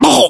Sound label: death; english